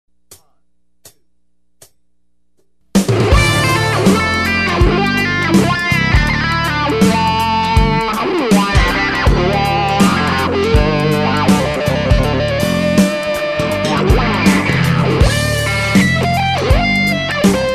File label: lp budda higaintest; rmc picture wah